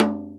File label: Toms, Real